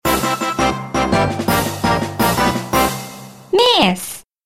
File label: Victory Fanfare